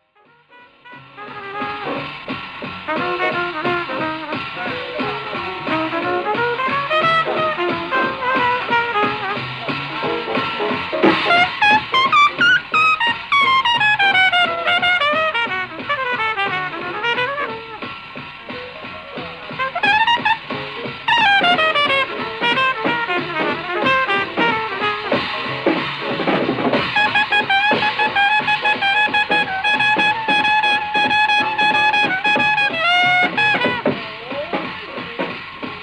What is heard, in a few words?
the bluest blues